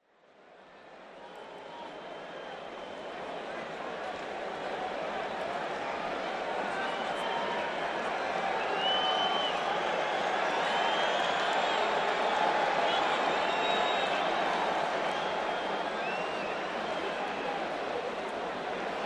Large crowd yells as a touchdown is scored during a pro football game in an outdoor stadium.; Cheer, Crowd Crowds, Stadium Football, Crowd